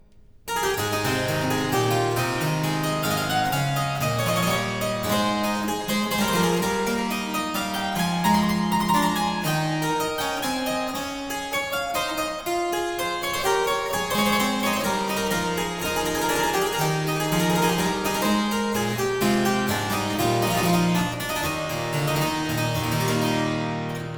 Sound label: Suite in A Major Courante, Harpsichord